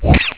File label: bang